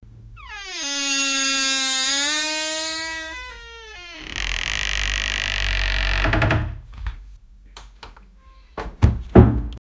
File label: old door opens creaking